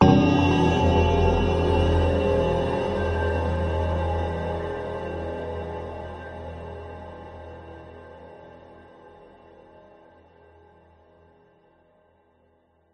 horror hit drone long decay atmosphere bright chime into dark discordant pads